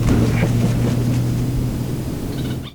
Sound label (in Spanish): Zopilote Rey